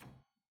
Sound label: Harpsichord Rel Main B0; Chordophones; Releases; Harpsichord, Unk